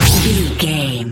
sci-fi weapon blaster laser boom zap

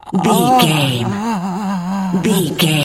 voice male death torture

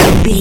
retro explosion short

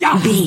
voice male hurt pain

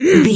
voice female attack

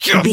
voice male attack

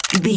gun semi auto rifle load bullet

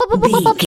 voice fun character vocal crazy

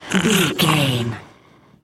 zombie voice breathing

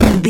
retro impact hit general

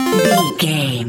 collect item chime

